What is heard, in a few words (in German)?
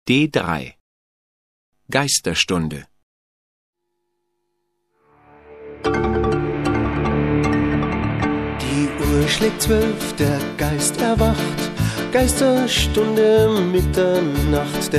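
lied, song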